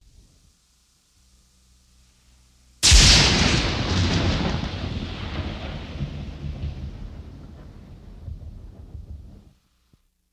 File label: Thunder Crack